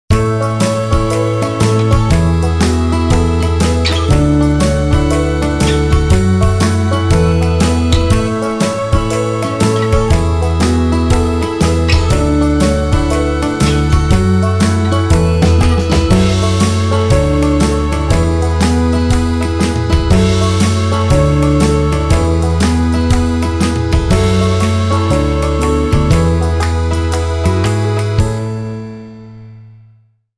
INSTRUMENTAL

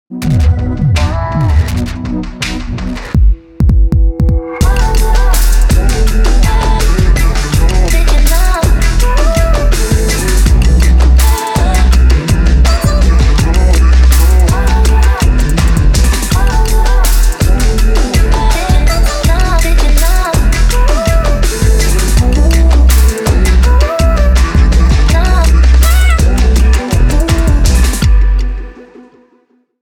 TRAP WATERFALL

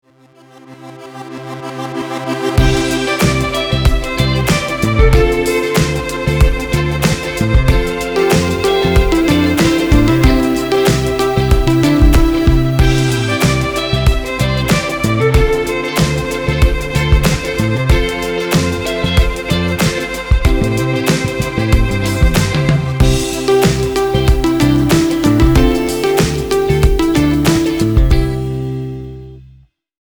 SWEETEST SYMPHONY (INSTRUMENTAL)

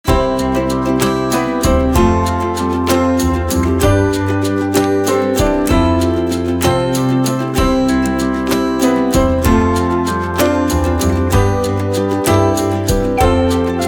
waltz

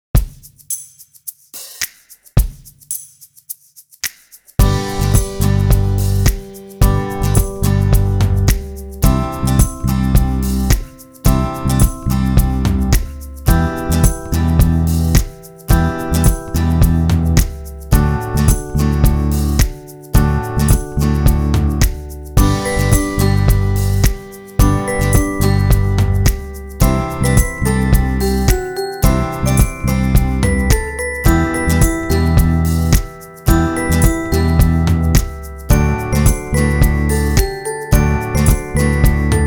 FOLKSY SUPER LIGHT

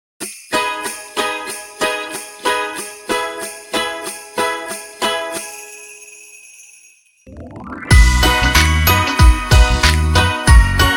INSTRUMENTAL

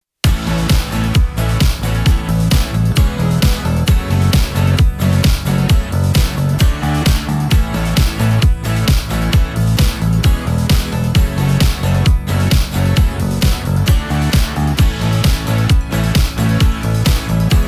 INSTRUMENTAL

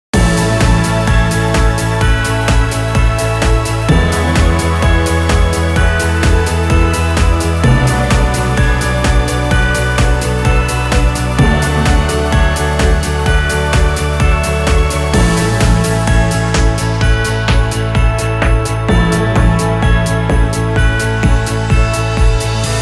INSTRUMENTAL

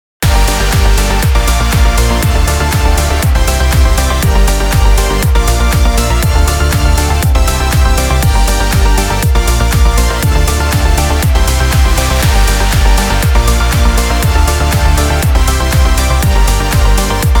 (INSTRUMENTAL)

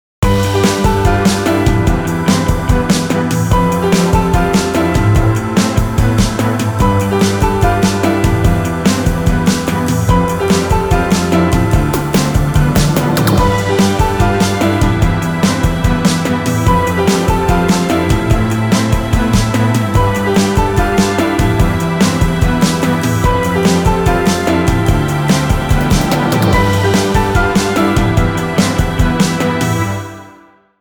PIANO

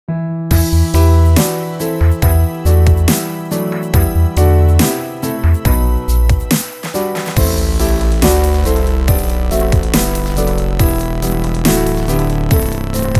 DUBSTEP

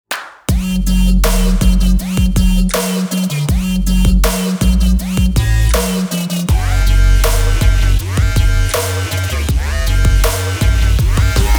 GUITAR MASHUP TRAP

